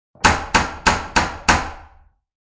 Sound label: Door Knocker, Five hits of a door knocker; Knocks (Sound Effects:Objects)